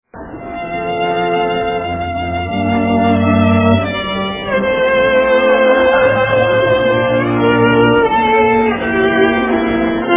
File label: Largo 2 violins